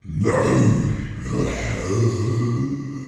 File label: Zombie Noises